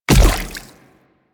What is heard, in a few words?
Splitter Step Water, Footsteps